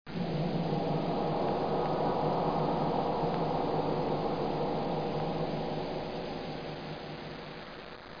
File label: gong2; Image Bonanza; Expert Software; music